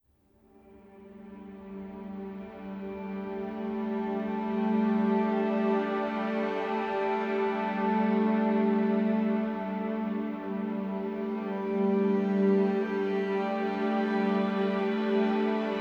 Spa Spiritual Fitness Music New Age

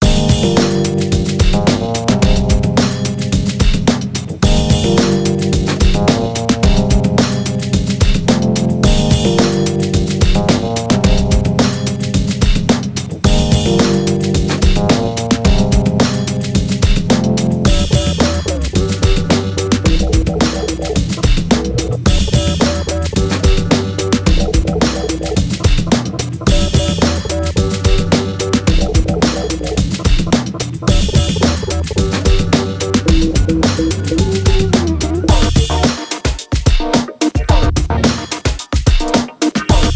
Deep Hip Hop Rap Beat

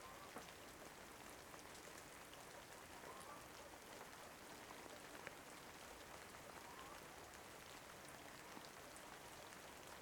Rain Sounds

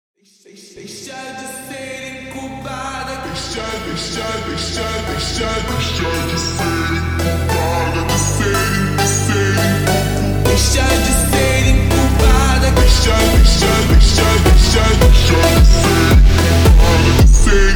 FUNK SLOWED

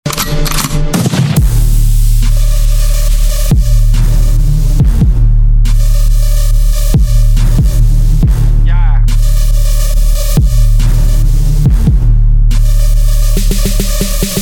Bass

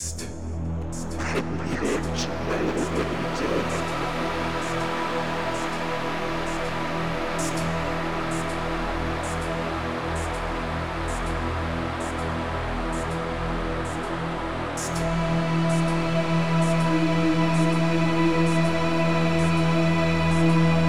Ambient

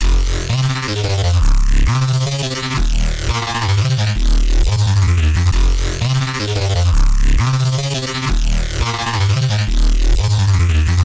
Bass

